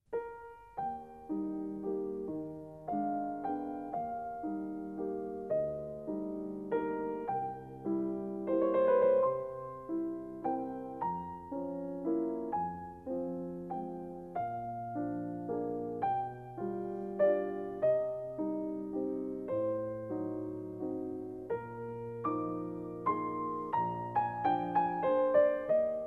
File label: piano before